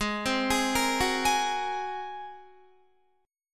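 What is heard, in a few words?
A flat 9th A flat Guitar, chord; Ab9 arpeggiated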